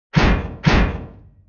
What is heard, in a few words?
Sealed Door